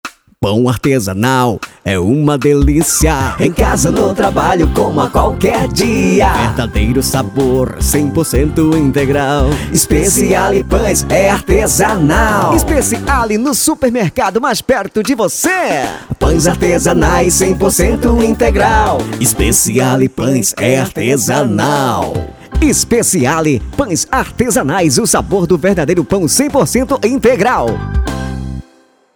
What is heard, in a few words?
JINGLE